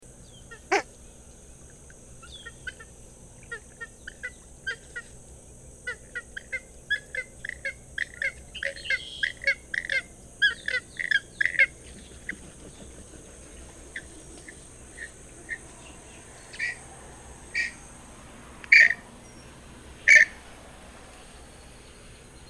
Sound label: Gray headed Swamphen, songs